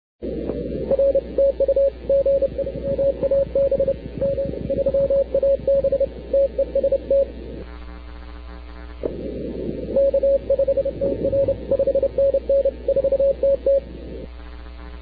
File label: cw; ARRL DX CW - 20M at K5TR; The first W5's are responding to my CQ on 14033 at ~13:45 on Saturday.